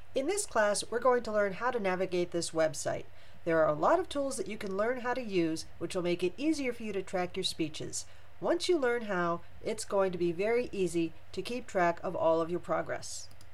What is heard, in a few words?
sine wave